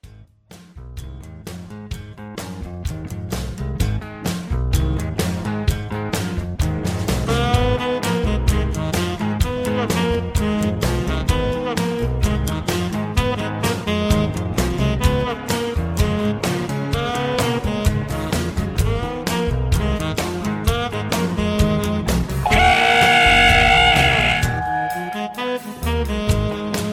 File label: Low Key